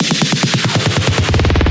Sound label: Fills, Loops 140 BPM